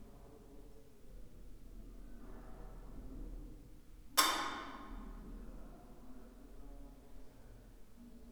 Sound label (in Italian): colpo anomalo di forte intensit. interno della stanza della musica in assenza di personale